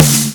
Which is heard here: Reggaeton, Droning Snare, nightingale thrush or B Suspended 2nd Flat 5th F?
Droning Snare